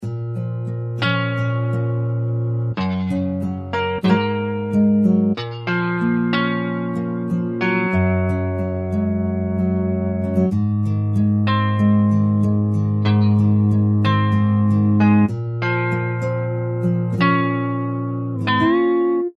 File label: SUNBURST NYLON